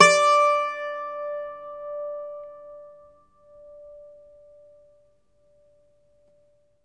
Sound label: IRISH BAZOUKI, Plucked Strings; E-MU Producer Series Vol. 7 – Old World Instruments